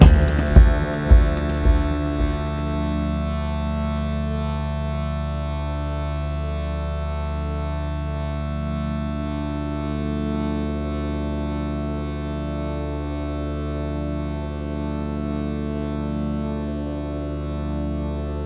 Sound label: Rash Organ; Unravel gamerip 2016